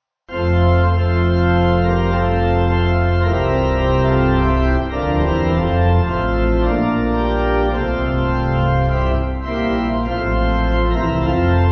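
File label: Pipe Organ, Music Backing Files for Churches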